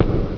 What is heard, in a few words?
land soft; Prince of Persia